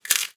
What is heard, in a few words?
bone crack; human